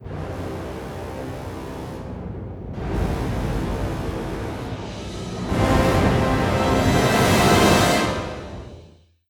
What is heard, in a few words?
Music Jingle Boss Fight Intro